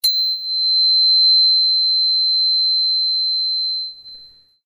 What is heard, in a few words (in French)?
crystal tuner 4096hz diapason therapeutique